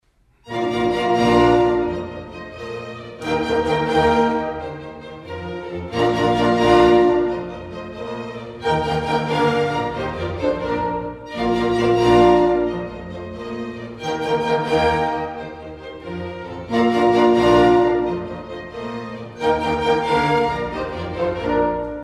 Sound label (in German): Mozart Sinfonie 24 Allegro